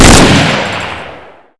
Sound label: fire